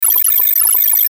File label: Data Transmission Fast Number Beeps; Communication; Blastwave